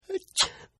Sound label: Sneeze; Sneezing; Human; Partners In Rhyme